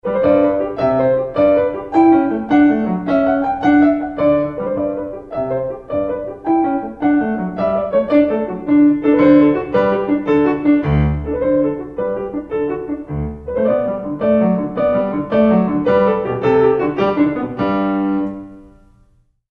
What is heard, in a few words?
Gigue; Telemann